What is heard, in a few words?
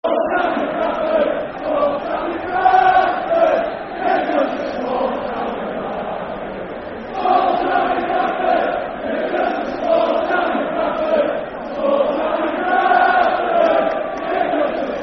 Small Town In Bradford, Football Chant (Soccer Song); Bradford City Bcfc